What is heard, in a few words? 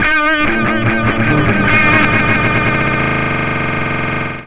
FX GTR, GUITARS KIT